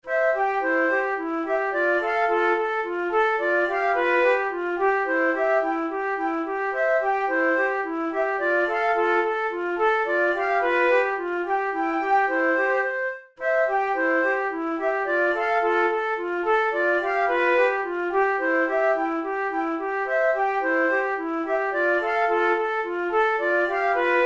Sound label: methode de flute; tulou